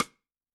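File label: Side Stick, Snare; Yamaha DTXpress-II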